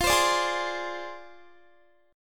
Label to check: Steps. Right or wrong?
Wrong